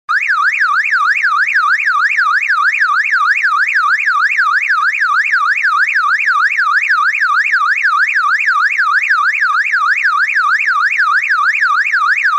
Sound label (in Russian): zvuk signalizacii mashiny